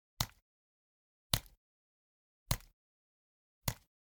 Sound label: Generic Button Plastic Small; boom library; The Interface